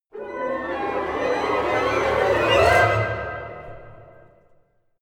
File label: orchestra chaos